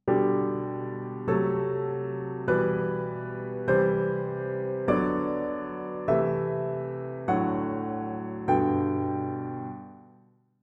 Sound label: chords